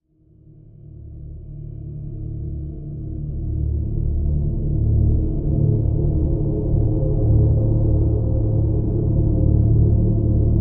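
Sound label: Drone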